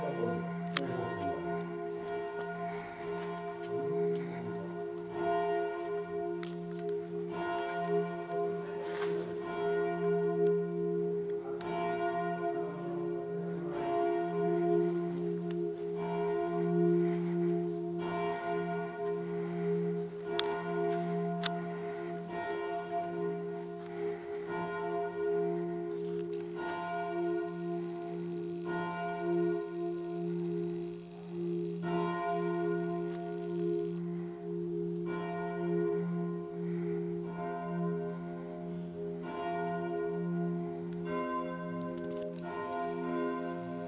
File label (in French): cloches d'Ocqueville